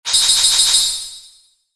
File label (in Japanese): ランク レア度5 モノラル